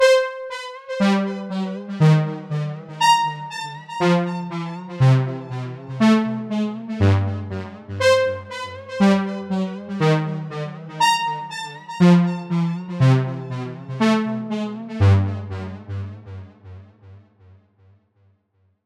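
Syn Brs Stab, Orchestral Toms Scale Up, synth brass stab; feedback echo plugin; Newfangled Audio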